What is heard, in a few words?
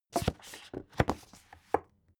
book shelve noisy; haus garten; wohnen